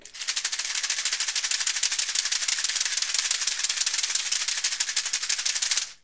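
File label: rattle, percussion; ILIO; Synclavier Percussion 2; World & Orchestral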